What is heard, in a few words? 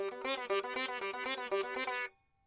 sitar